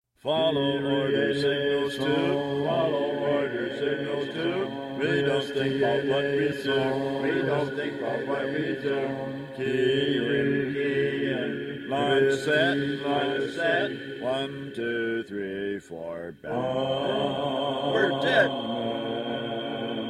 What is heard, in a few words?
Chant